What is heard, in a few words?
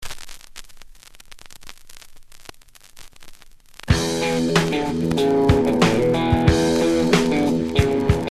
cracky